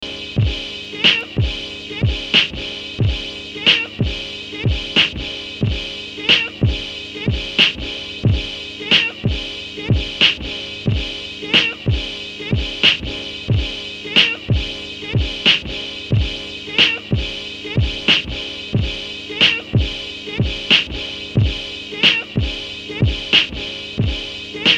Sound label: mpc2000 beats soul and glitches